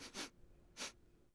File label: sniffs short; Civ4